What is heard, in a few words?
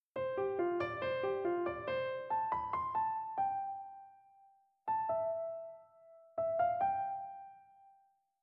fanfare wo swing; victory fanfare